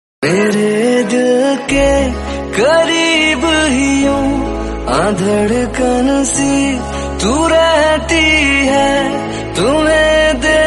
hindi song ringtone, bollywood

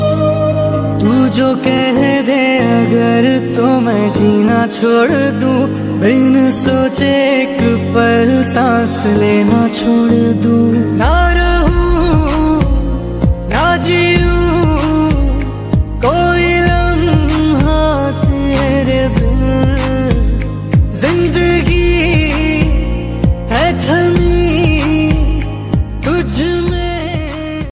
ringtone, bollywood ringtones

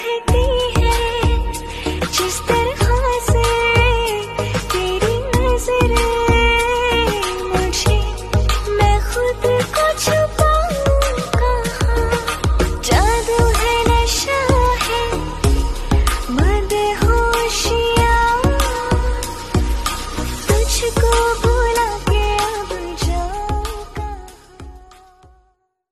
bollywood ringtone

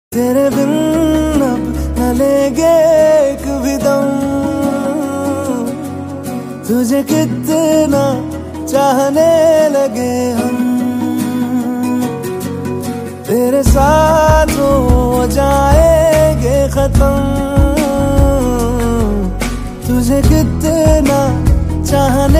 new song ringtone, bollywood ringtone; kabir singh

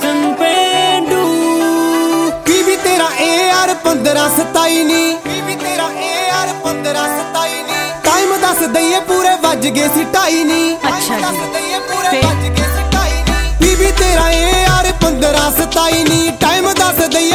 punjabi ringtone

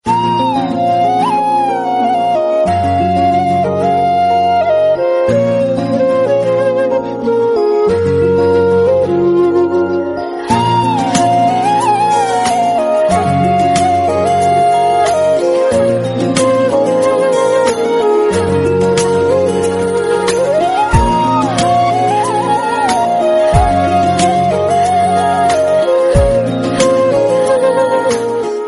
sweet soothing flute music tone ringtone

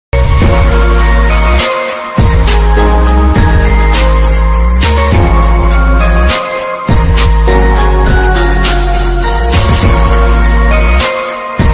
instrumental ringtone; bollywood ringtones

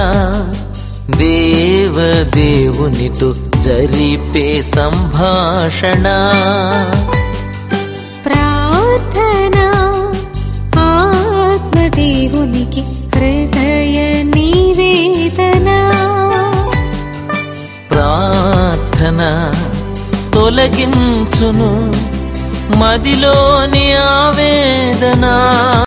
telugu christian song ringtone, christian gospel

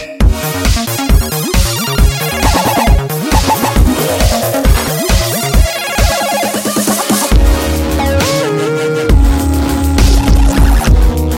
new vivo x20 plus ringtone

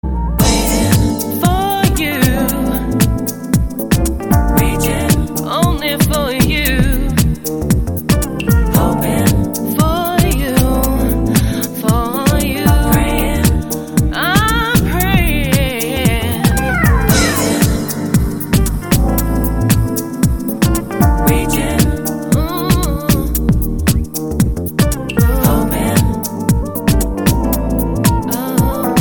english love song tone ringtone

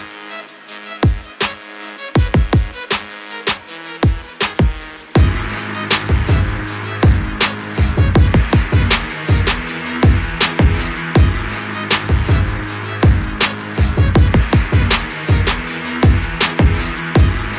dj hip hop ringtone

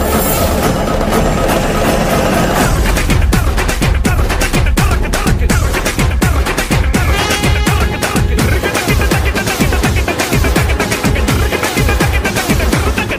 theme bgm ringtone; viswasam; funny ringtones

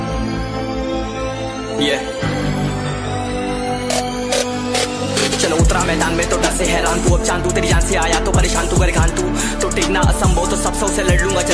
sweet music english ringtone

